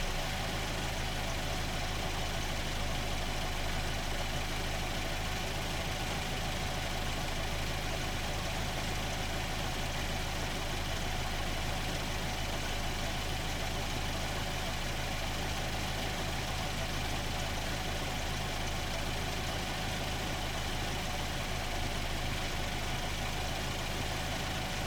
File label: Truck, old, idling, engine bay, under hood, closed, loop; Eiravaein Works; Vaeyan IV